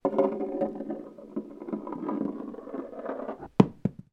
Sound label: toy marble roll across wooden table drop on floor